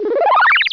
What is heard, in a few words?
power up